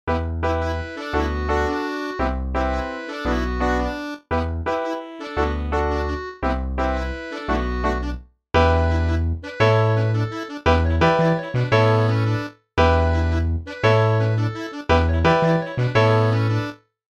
rondeau descendant trad

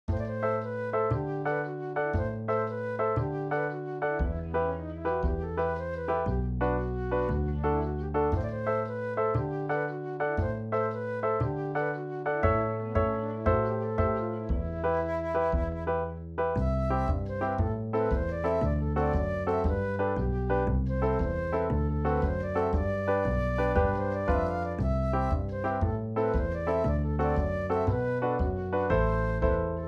nachensko horo

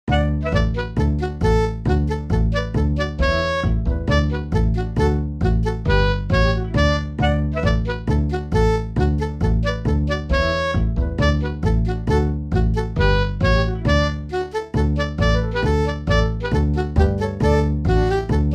polka irlandaise

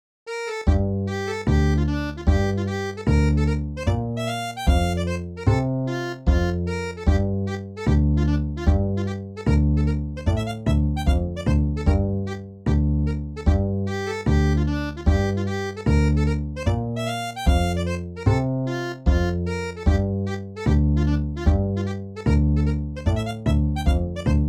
hornpipe; lavarda

